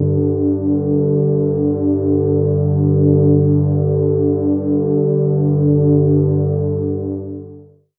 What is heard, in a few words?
pad d3